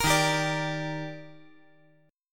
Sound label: Eb Major 7th; EbM7; strummed; JGuitar